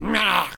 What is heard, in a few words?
Goblin Injury; SyrinScape